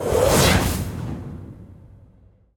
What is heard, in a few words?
Teleport in